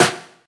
snappy acoustic snare f key; snare-drum-samples

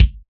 natural kick one shot A key; kick drum samples

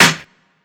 clicky acoustic snare sample E key

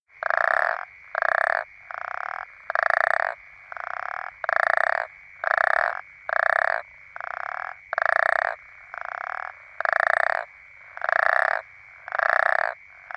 african common toads

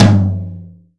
high floor tom one shot g sharp

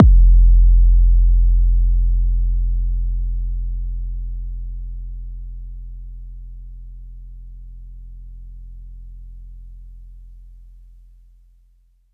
phonk kicks oneshot 808 F; kick drum

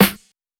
subtle reverb snare drum g key

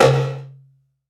acoustic tom sound c sharp key

